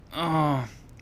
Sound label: moan